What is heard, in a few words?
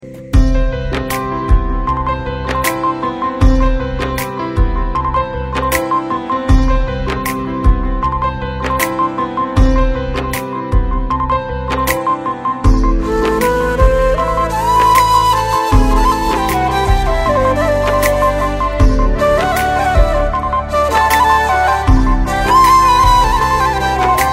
Song Instrumental Tone